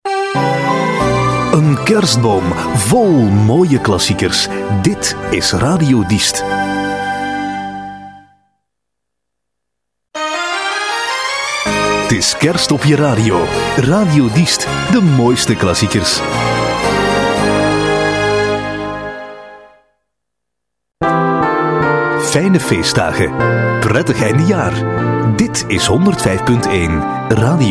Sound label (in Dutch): jingles Kerst; Diest